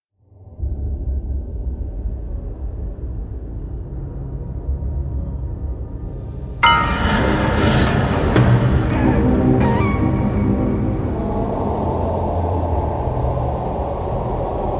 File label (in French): suspens